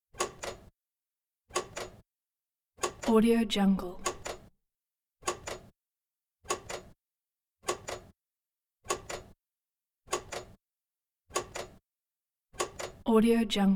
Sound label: transporter